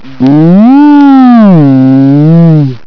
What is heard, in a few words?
moo